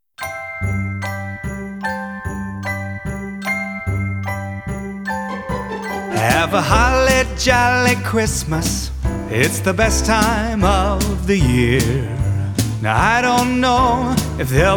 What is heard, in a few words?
Guide Vocal